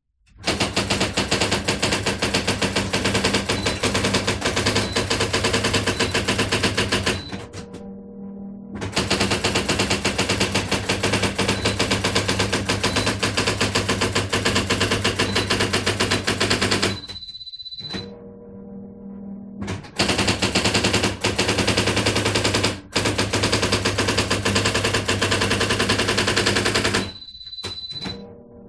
electronic typewriter typing room pov reverb small; brother ax 450